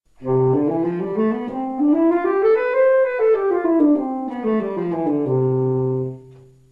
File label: D Major Scale tenor